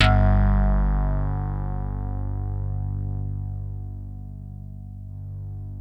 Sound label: DIGI RHODES; Sound Gallery - Charged